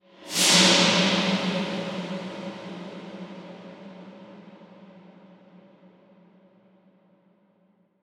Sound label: Deep Swoosh